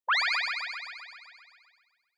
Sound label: Motion Blow Away Delay